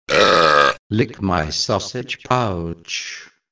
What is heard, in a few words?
BURP